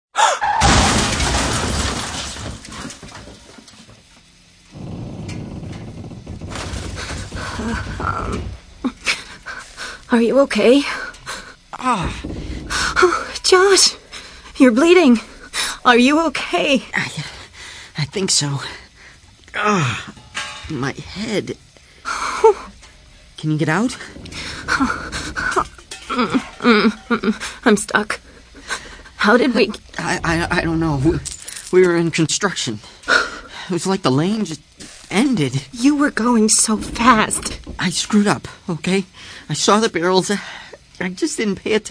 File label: crash